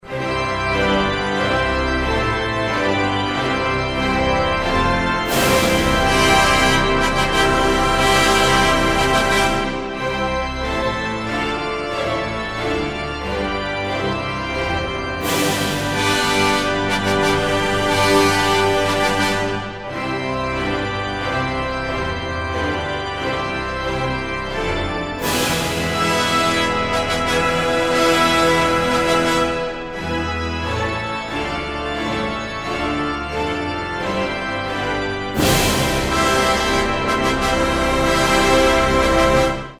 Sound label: Organ Symphony; SaintSaens